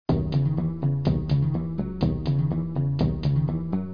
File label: music; Egoboo